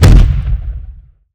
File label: walk